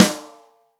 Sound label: ROCK SNARE CHROMATIC, Real Drum Kits